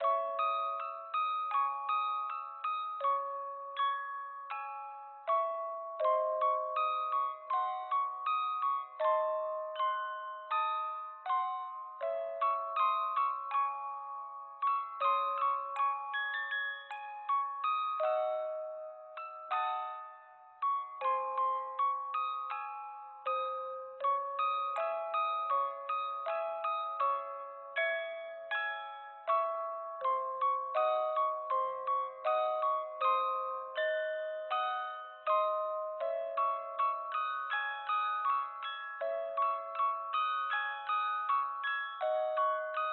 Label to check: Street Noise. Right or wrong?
Wrong